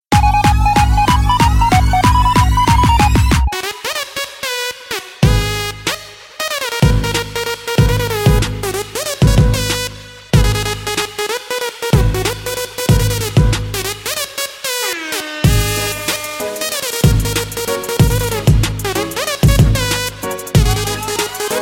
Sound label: trap remix english ringtone